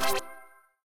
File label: quick stop; dazzle mw